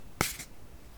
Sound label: card Shove, Casino; Kenney's Sound Pack